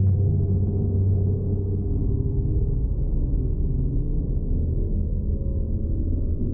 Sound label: Camp, ambience